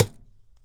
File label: Good Thunk